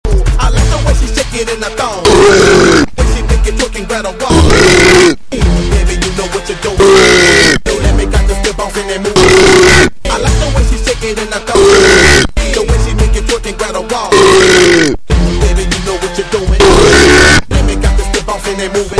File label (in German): ooohhhweee